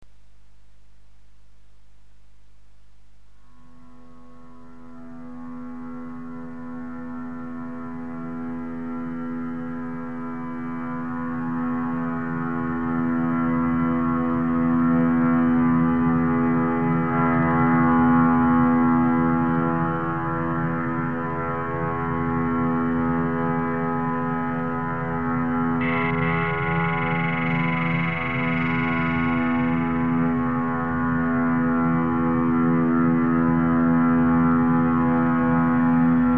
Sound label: telephone